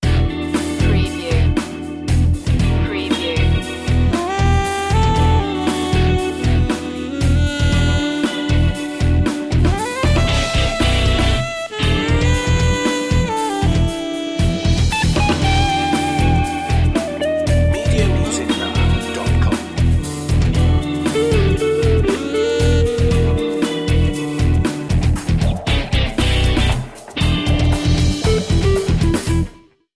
rock fusion

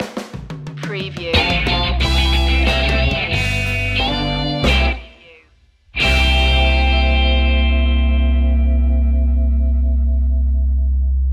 happy birthday funk stinger

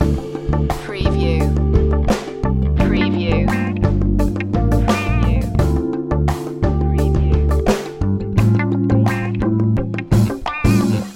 havanna drive loop

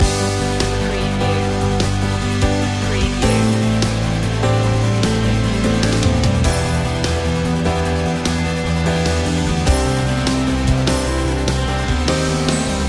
piano pop loop

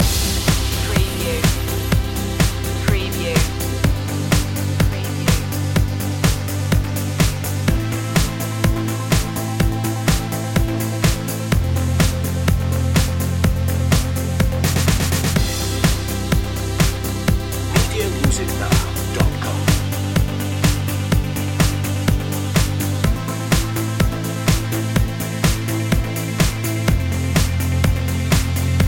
liquid gold loop

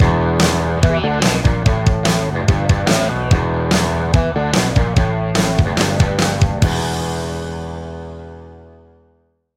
rushing stinger

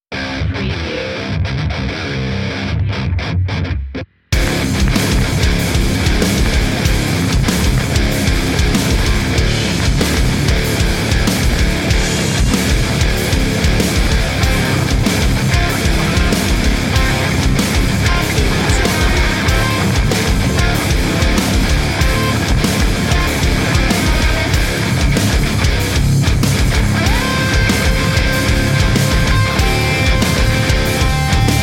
battleground

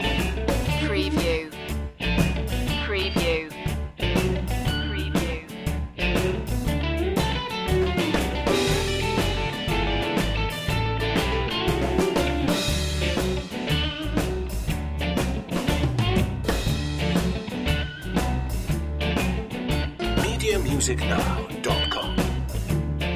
shuffle off loop

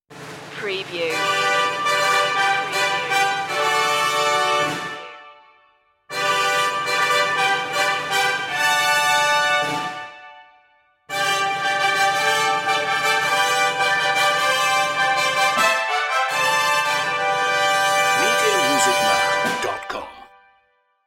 god save the king fanfare only

